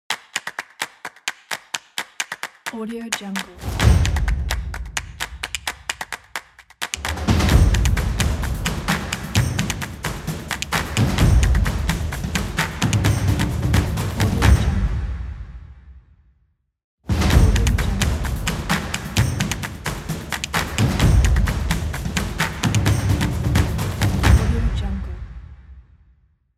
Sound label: energetic percussion and stomps logo